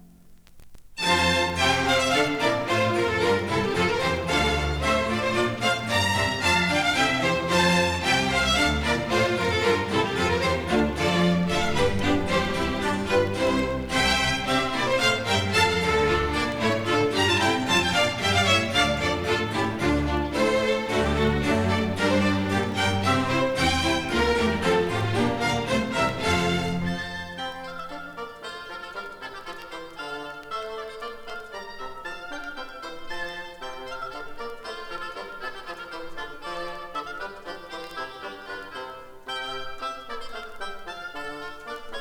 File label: Water Music; Handel; HVW348